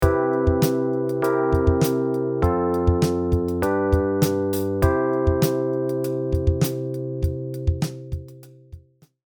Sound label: Cmaj7 C7 F G C